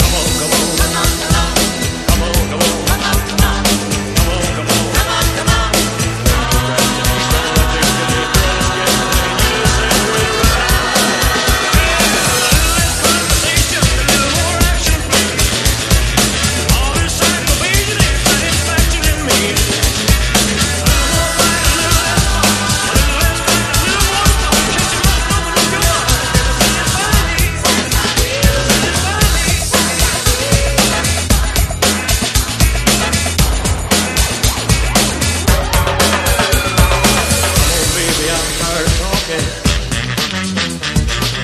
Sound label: 115bpm E